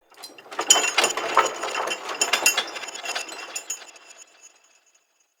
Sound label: Sky Fortress Chain Move Trippy Low; Ode Sky Fortress; Tunnel